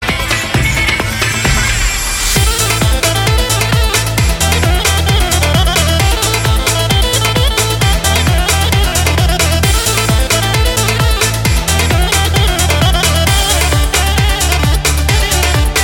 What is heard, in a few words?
Pop-folk Ringtone